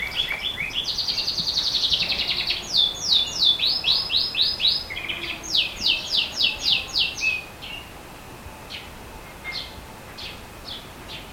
bird unknown

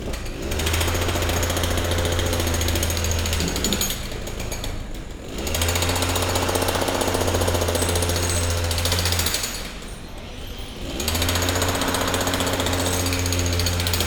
construction

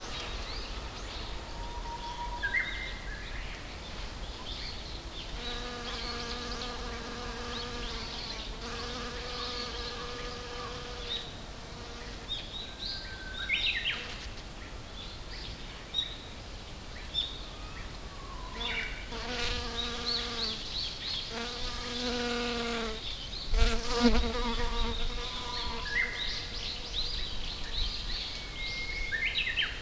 gadfly

